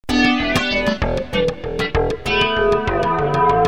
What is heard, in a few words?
Kawai s5000